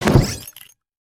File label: popup; Strombie